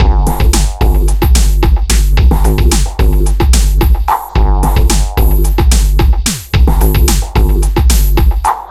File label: machine madness 110bpm, downbeat dance grooves